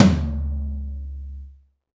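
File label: tom low, Roland; Club-50 - Foundations Roland; Ext.Snare 2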